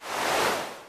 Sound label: drag the cloth; operation